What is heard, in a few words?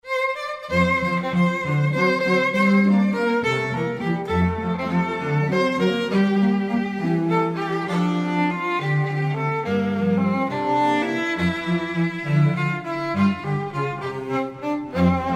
waltz, string quartet